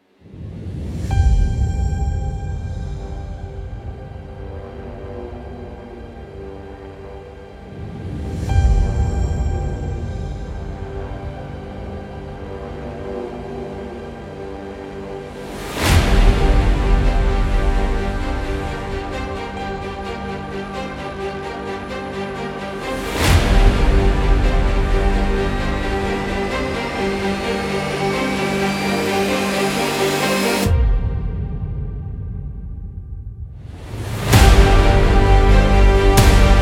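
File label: Action War Intro; intense trailer themes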